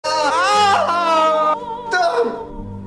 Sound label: waxing pain